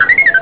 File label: blip